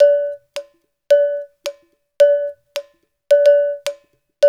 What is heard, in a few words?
UDU, Udu Bata, African; Spectrasonics; Supreme Beats